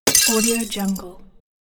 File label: broken window